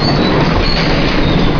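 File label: elevator move; svencoop; cracklife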